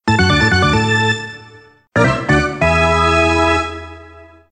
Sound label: Bowling Jingle; Black Panther 2 B-Sides; PSP